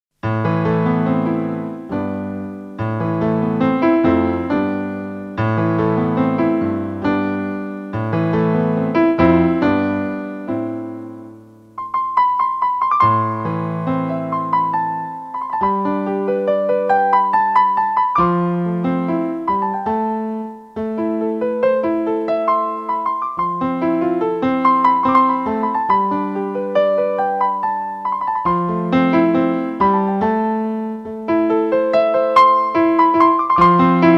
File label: Instrumental